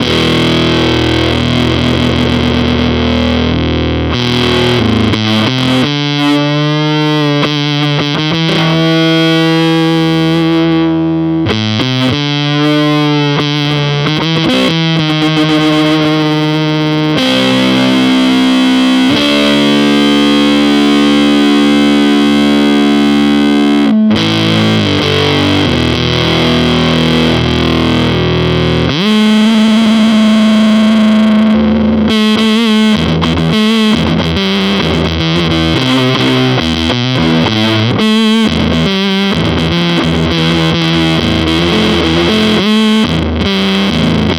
Dunlop Authentic Gypsy Band Fuzz

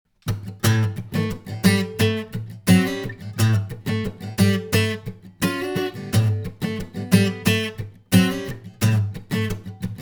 Mic Percussive

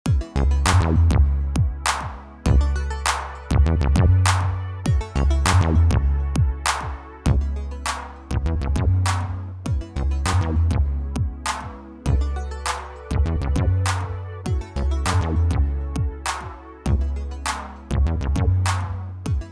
Roland Dimension synth hardware

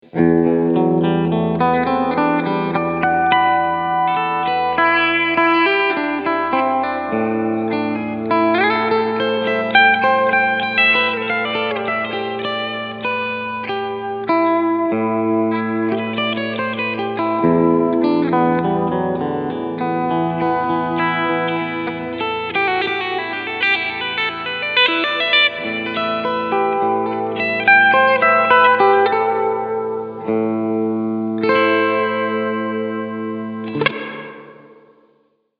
Keeley DDR Hall Pur Medium Decay

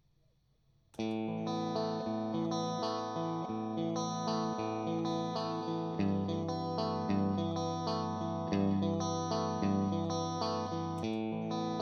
Loop

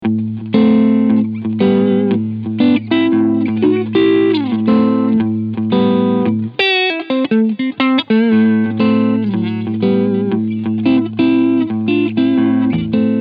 Cleansound Front PU

